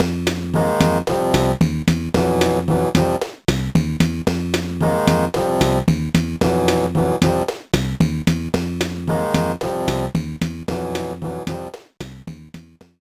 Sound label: World 2 Map (Desert Land); SMA4